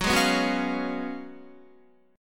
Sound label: Gb Major 7th Suspended 2nd Suspended 4th, Guitar, GbM7sus2sus4 chord; GbM7sus2sus4 strummed; JGuitar